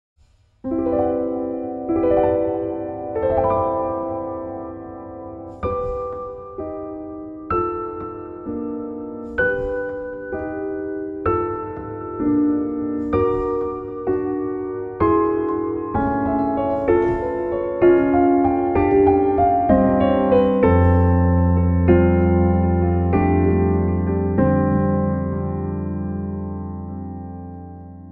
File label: chime